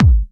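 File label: BD Trancy, bass drum; Takebeat; Club; VEC1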